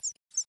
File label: benign wobbles audible